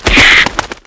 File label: SKULLBAT die; Eft